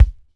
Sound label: phat kick single hit a key; Mono; kick drum samples; single hits one shots